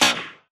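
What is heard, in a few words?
impact bullet generic titan shield; Titanfall 2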